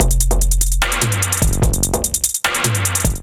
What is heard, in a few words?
Kit 148 bpm, Drums; MIDI Clips; Trancil Exit Kit; Ableton; Factory Packs; Skitter and Step